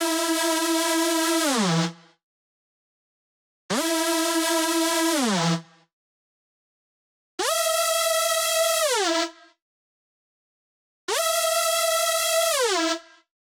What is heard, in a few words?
Machine Synth 2 Root E, 128BPM; Vengeance Samples; Machine Kit